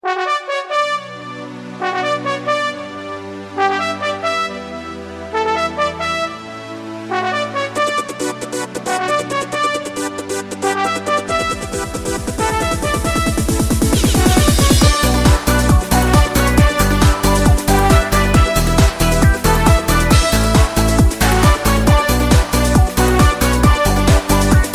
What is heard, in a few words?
instrumental